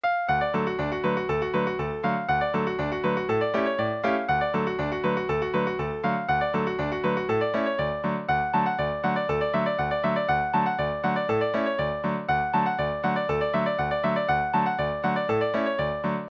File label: reel, session tune midis; chicken